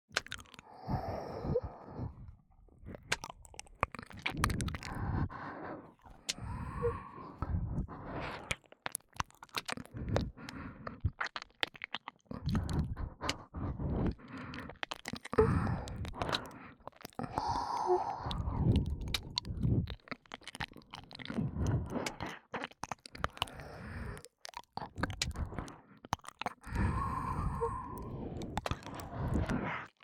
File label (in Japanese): (右) お耳直舐め耳舐めエッチ, ASMR; 白耳; パンパン系効果音版